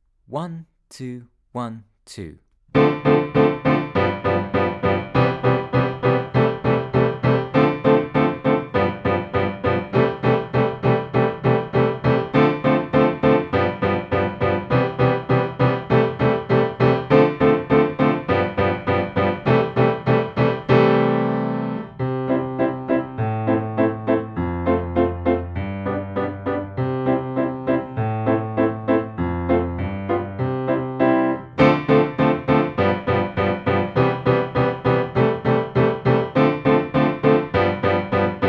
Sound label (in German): Weihnachtsglöckchen Playalong